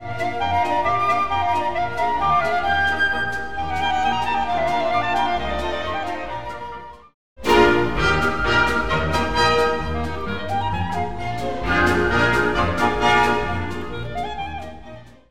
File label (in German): Polka